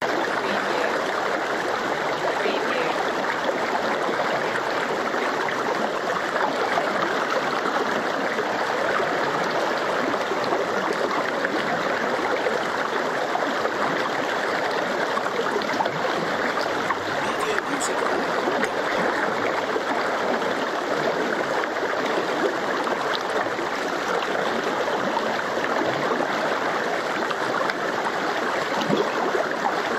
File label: Brook Low